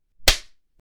Slap

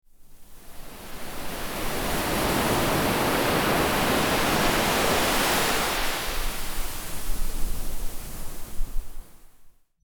Beach

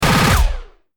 Laser blast

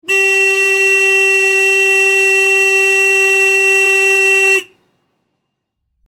Car horn honk

